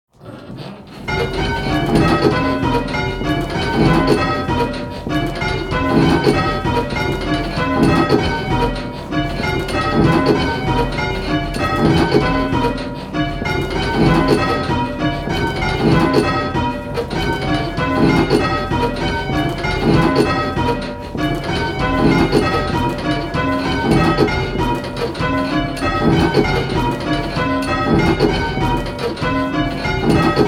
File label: Phrygian St Simons, bells; S Simon's Doubles